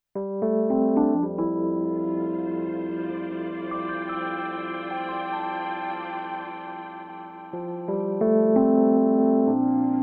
keys of 60s 70s; xp-80; roland; Multi-patch demo; roland demo cd-3

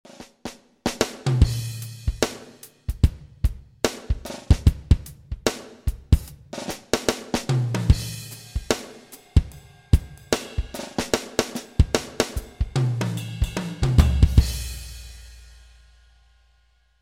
Poppin KIT, xv-5080; SRX

